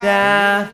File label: yeah SUNG